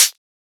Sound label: Closed Hat; FLPRO